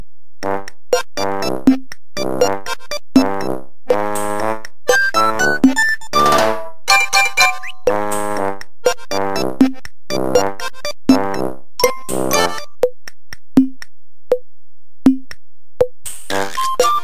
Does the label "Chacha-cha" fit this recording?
Yes